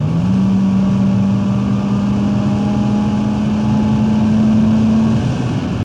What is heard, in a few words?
Mustang Int Hard Acce